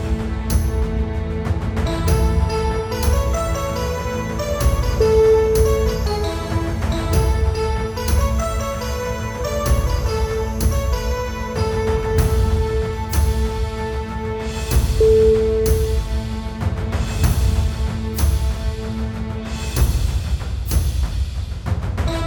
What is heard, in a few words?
i saw three ships orchestral